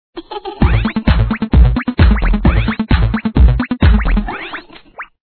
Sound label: Alert